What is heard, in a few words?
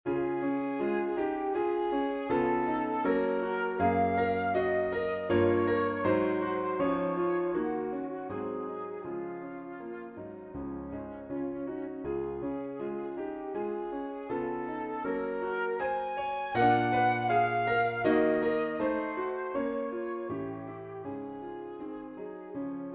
for flute piano; dvorak antonin frn hling from lieder